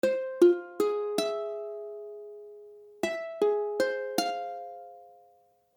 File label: Fmmaj7, akordy ukulele